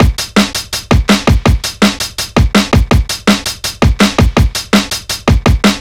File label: 165, Drum Loops, Drum & Bass